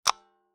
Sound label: Taxi Meter